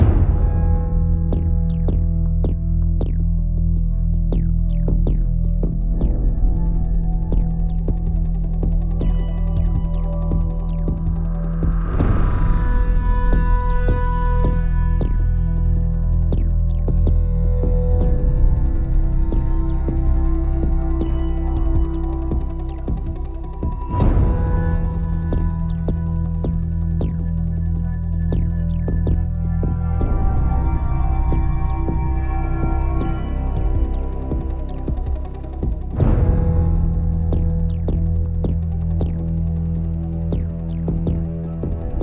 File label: Thrill Loop